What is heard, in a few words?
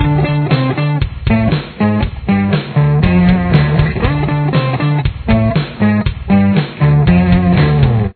riff, guitar